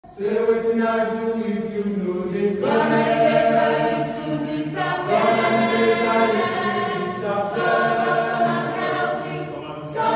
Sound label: Choir Version Acapella